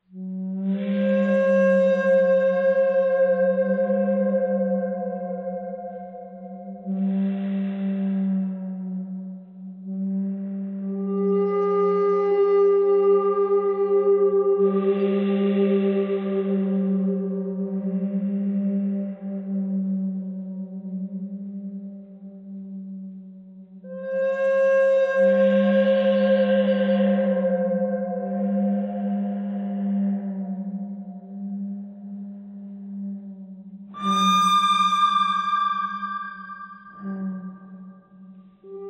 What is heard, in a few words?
bird bird canyon